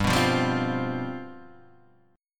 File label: C Major 7th Suspended 4th, G, guitar chord